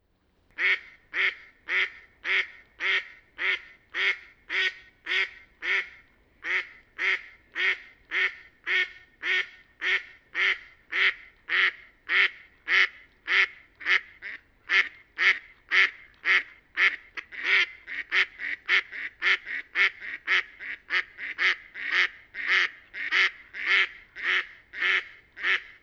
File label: Didžioji Antis Mallard - Anas platyrhynchos